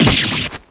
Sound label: damage; dhurke